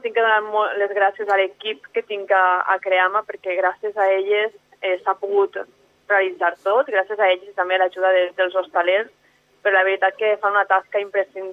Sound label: Tapes